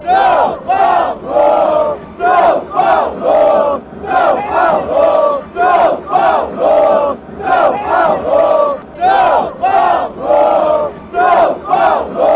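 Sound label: sao paulo chant, football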